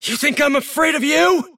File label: attack pain; davion; dota